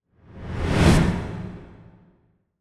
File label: Whoosh